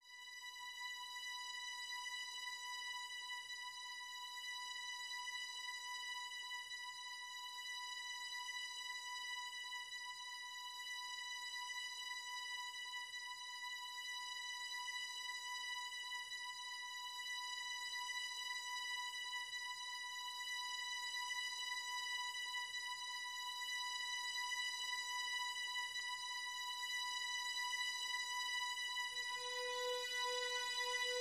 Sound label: Building Tension